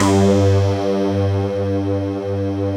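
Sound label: STACK BASS G3; Drums In Your FACE!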